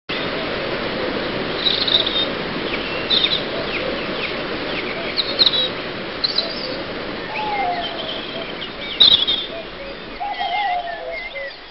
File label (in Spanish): amanecer; biosfera